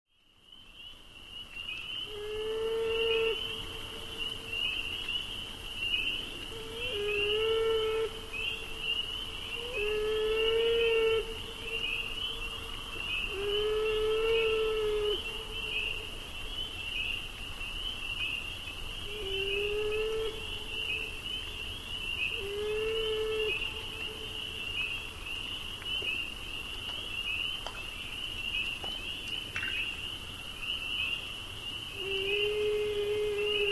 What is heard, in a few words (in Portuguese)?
Castor Chorando; na natureza tambem tem musica